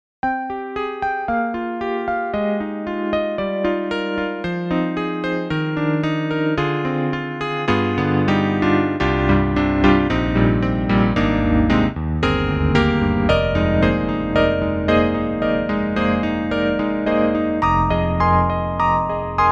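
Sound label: YAMAHA MOTIF-RACK XS Journey, piano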